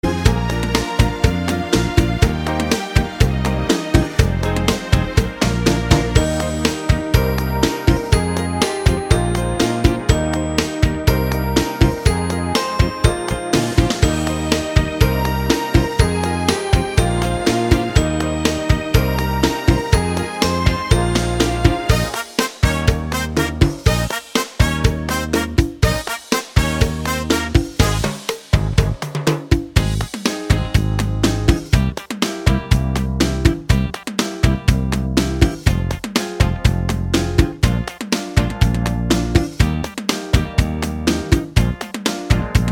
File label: cha cha